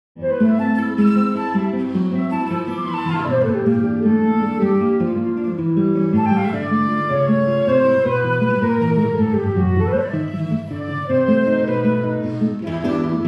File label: flute guitar center IR room